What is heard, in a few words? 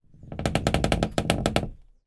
household door creak wooden hollow